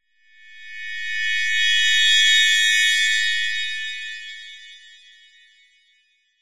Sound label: horror shrill chilling high pitched screech